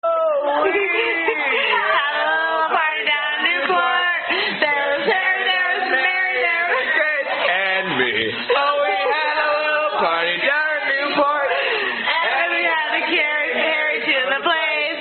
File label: California Drinking Song